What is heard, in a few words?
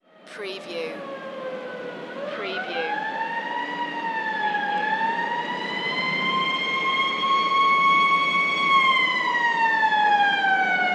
Firetruck Siren Approach End